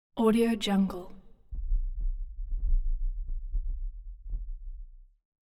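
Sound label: metal smash low frequency effects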